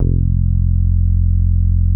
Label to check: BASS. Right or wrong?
Right